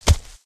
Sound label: hoof ground, step